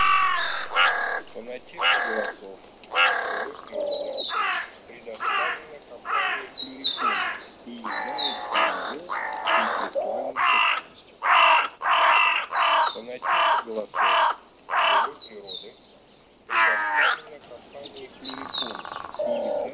Черная Серая ворона Cornacchia

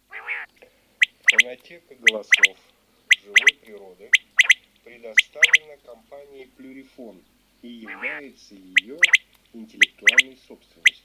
Перепел Quaglia

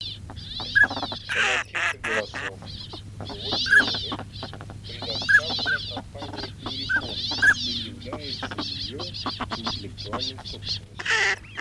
Шилохвость Codone; plurifon

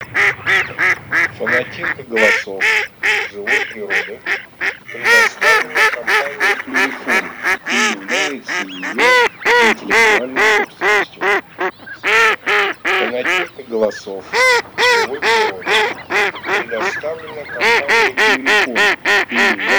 Кряква Germano; plurifon

